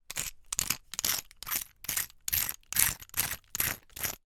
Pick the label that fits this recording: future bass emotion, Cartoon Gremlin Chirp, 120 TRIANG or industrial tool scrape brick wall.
industrial tool scrape brick wall